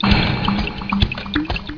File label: water station